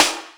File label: Boss DR660 sd